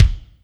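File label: kick Kinetic, Alesis D4 drum machine; 300 Drum Machines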